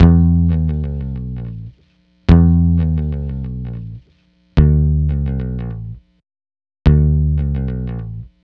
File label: Multi Guitar Bossa Bass-E, 105; Zero-G Kocktail Kollection; Construction Kitz (Lounge)